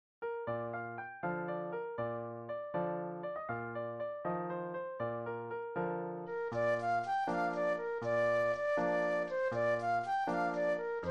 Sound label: flute solo